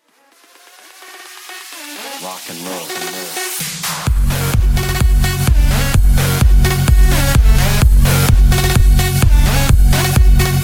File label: Rock n roll